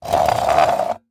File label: Industry Tool Saw Scraping Wood Fiber Long